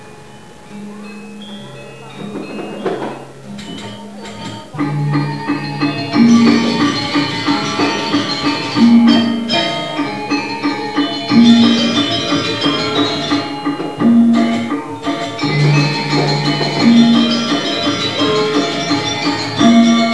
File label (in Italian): suonerie musica ritmica; rhythm